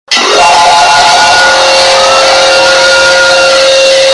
drill

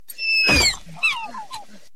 dilophosaurus playful

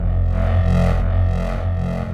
urgent character switch sound effect

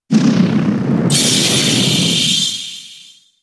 freeze shock

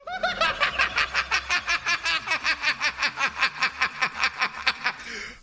cackle

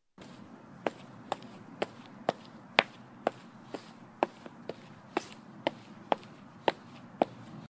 footsteps sound effect

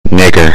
epic beat

